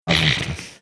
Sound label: snorting